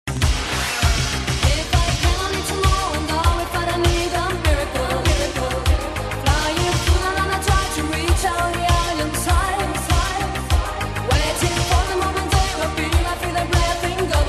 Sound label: Italodisco